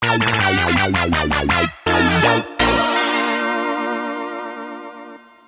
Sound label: you lose; puzzle bobble 1; 3do